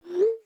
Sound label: Apple iPhone Notification Tone